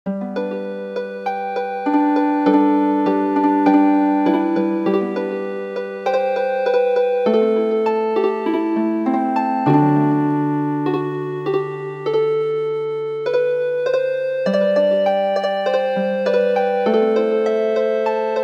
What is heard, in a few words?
Healing Harp; The Curragh of Kildare